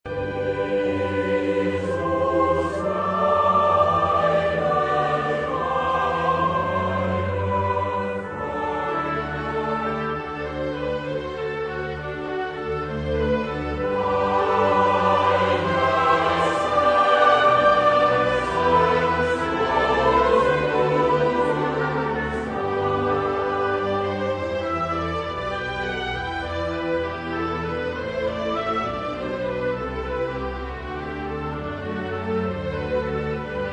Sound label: Cantata, chorus; Bach; BWV147; Jesus bleibet meine Freude